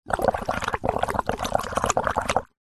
drink bubbles blow glass water surface through straw